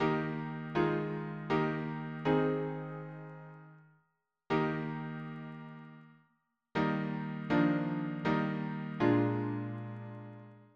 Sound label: harmony piano